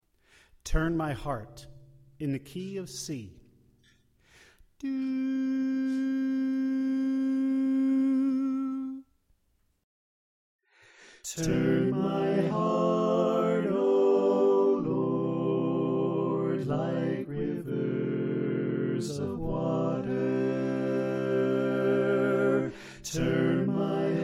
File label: Soprano Left